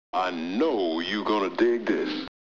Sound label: Dig